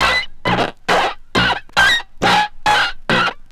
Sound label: Kaos FX, Ambient Grooves